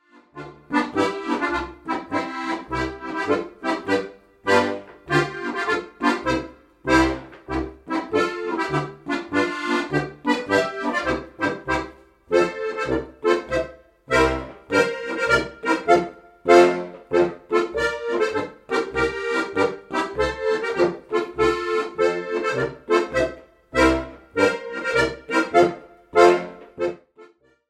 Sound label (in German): polka